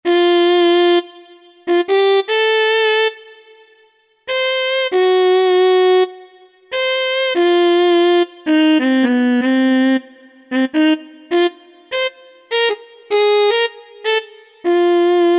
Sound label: Jazz Muted Trp